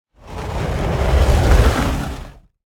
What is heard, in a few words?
drag heavy box